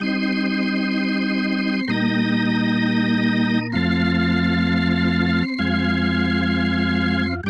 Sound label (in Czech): varhany